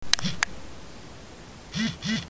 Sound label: vibrate